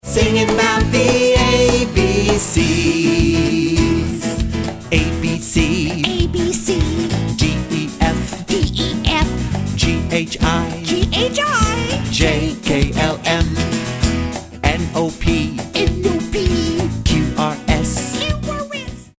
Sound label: Singing the ABCs